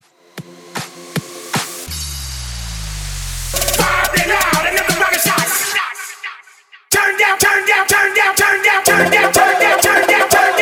Transition Bass house 100 124 Bpm

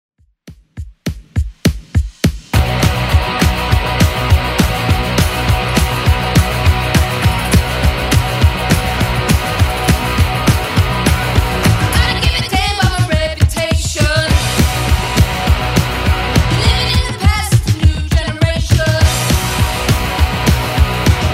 80s rock redrum 102 bpm

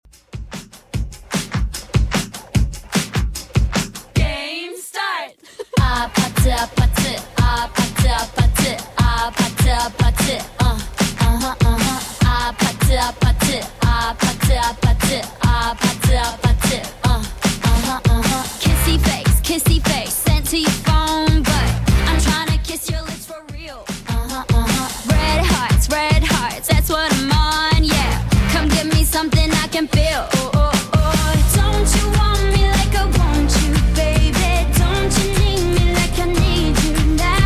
Top40 Redrum 149bpm

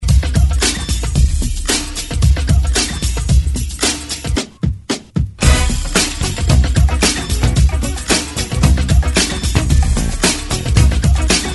70s Re Drum 112 Bpm; DJDOLLS

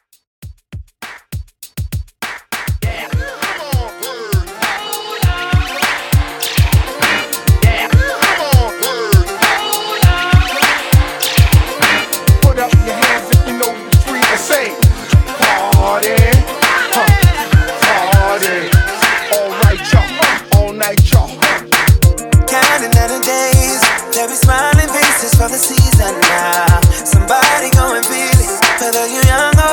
Christmas Top40s ReDrum 100 BPM

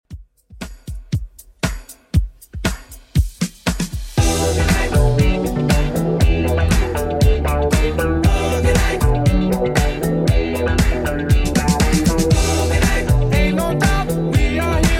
70s Redrum 118bpm

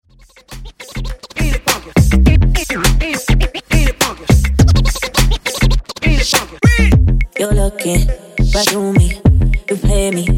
Jungle Scratch Dj Intro Outro 103Bpm

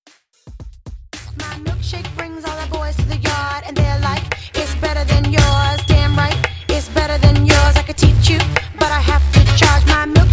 Hip Hop R B Redrum; Remixfreakz

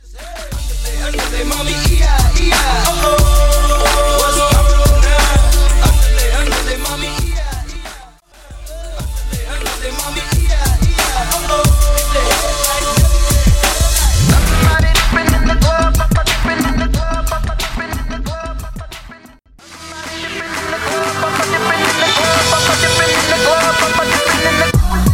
Hip Hop RB Megamix

